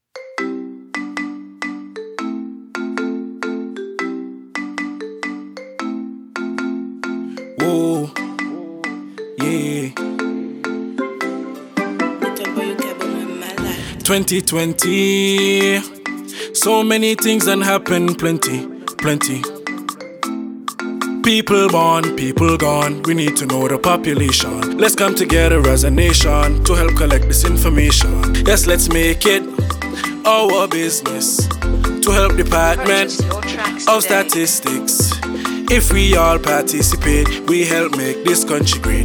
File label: Census Jingle